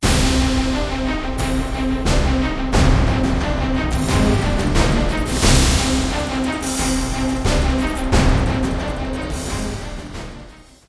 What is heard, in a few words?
ship theme, music